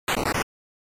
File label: SNES RPG Run